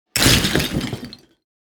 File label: Various sized rock pieces thrown on big blocks